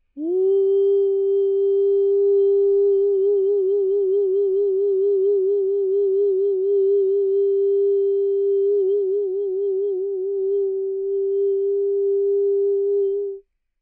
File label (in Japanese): uragoe